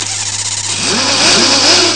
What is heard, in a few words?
motor; ST-XX Amiga Soundtracker Sample Packs